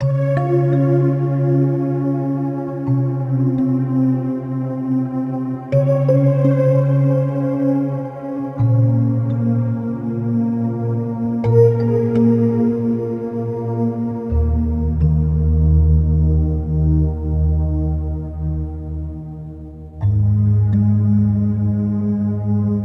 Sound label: Verse Melody C 84BPM